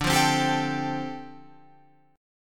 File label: D Minor 11th D down Guitar Open F; Dm11 strummed